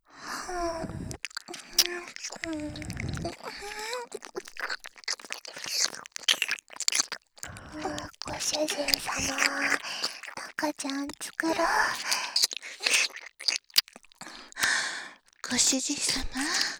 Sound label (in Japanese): 耳舐め; ハーレムオナサポ 4人のメイドがご主人様のオナニーをお手伝いするバイノーラル～文、千曜、名月、莉桜～; 赤ちゃん作ろ♪